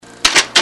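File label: typewriter r; machine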